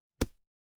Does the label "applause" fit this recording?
No